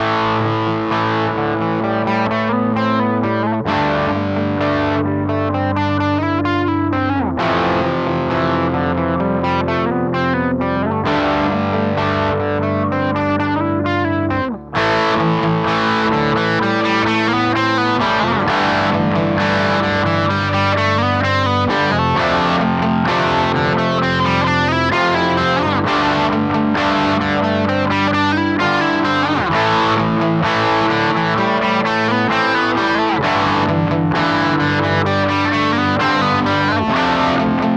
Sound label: EQ Treble; Source Audio EQ 2